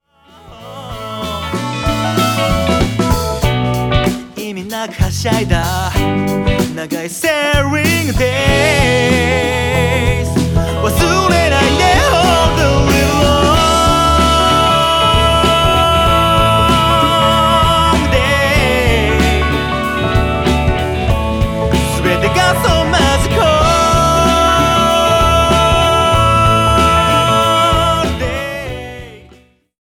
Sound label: magical